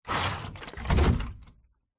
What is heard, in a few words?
industrial large heavy 10l tank plastic full water set down hard ground; zapsplat